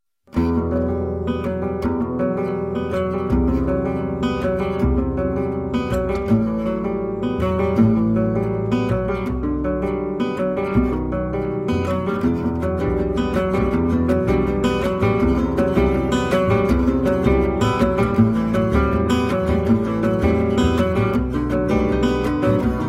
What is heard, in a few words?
cool guitar, jazz